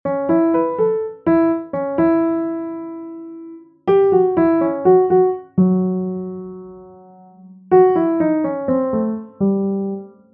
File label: bossa nova